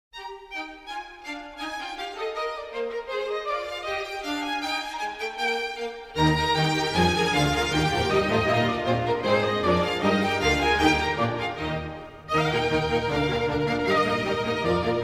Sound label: music